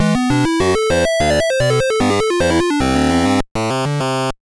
Stage End; Donkey Kong Jr; Atari 7800

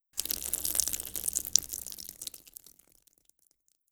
Blood Drips; Legend of Keepers (gamerip) (2019)

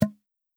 Human Hunter Pop

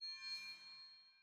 Saber

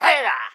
Pecheneg Red Attack

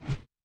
Obstacle Pipe core Destroy; hello kitty friends match; android

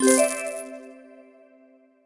Game Coin Win

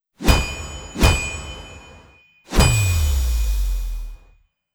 Blacksmith Cinematic Audio

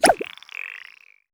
Grab Wall

